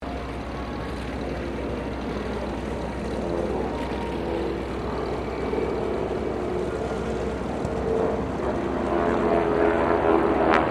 AIRCRAFT UNKNOWN PROPELLAR SQUADRAN PASS; Airplanes; Vehicles

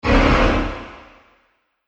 Arcade Game Subtle Hit Ambience, retro; Video Game Sound Effects; Think Nintendo, Atari, C64; Andrew Fleming

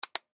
Button Switch

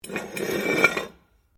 METAL ON STONE SCRAPE; Materials

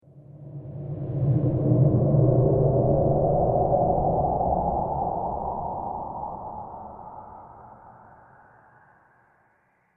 Teleport, science fiction

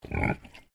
Animal Pig Landrace Grunt; Alice; Edgar's Mission Farm Victoria; Pigs; Animals

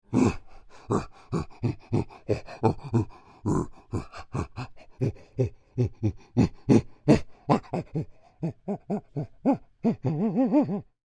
Creature, Human Imitates Monkey, Grunts, Low; Monkeys

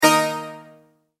Multimedia Tone-Stop; Tone Sound Effects; Tones (Sound Effects:Sound Design); McKinney Sound

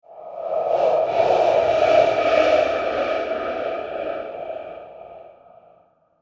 Horror Ghostly Swirl Whoosh

